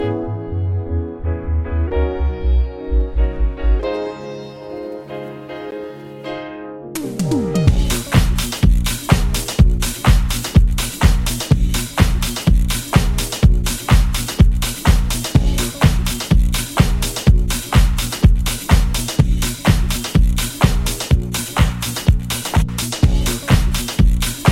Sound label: Wonky Bassline Disco Banger